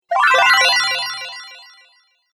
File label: Fairy Magic Spell; Magic Sound Effects